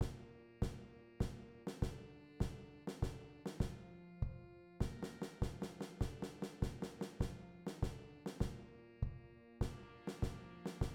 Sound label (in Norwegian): marsj perc